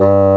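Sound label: woodwind G3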